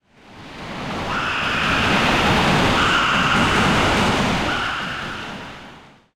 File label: heartbeat; superstriker